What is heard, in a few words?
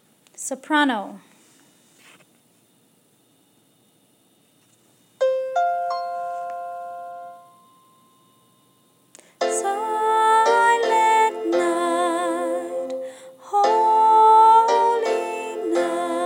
silent night soprano